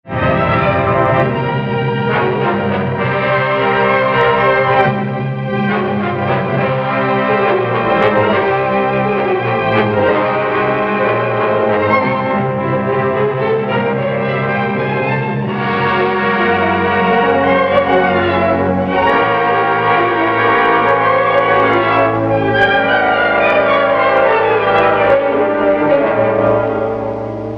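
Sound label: dramatic old orchestra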